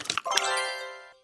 key hit eat bubble burst right answer

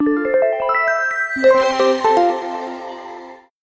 game complete effect

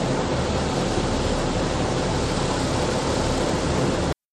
hovercraft

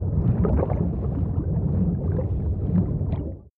starfish

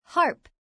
harp

